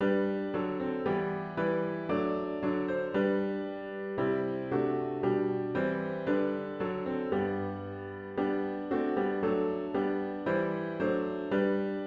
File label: As with Gladness Men of Old piano with Intro